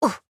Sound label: A Hurt